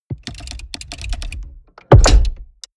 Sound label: mechanics large lock rattle; industrie-wirtschaft; mechanik